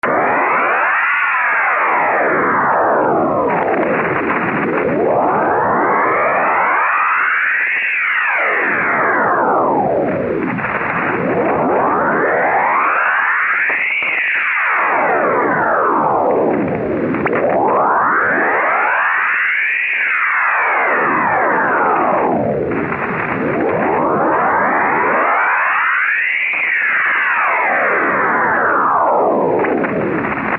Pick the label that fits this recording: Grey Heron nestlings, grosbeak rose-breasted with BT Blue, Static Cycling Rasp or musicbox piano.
Static Cycling Rasp